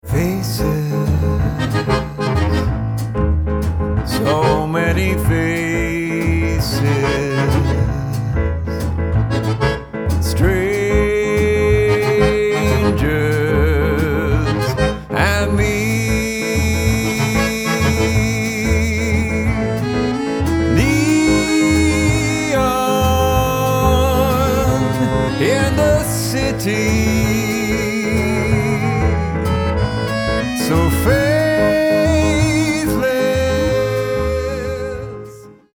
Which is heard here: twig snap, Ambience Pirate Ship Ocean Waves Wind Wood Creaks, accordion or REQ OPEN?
accordion